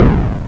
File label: guardian fire; Powermanga